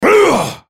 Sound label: effort; volibear